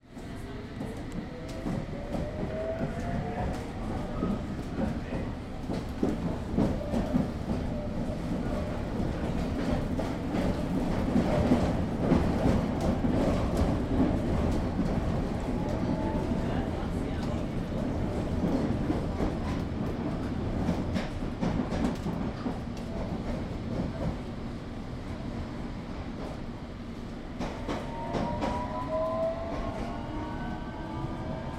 transportation london underground train ride between stations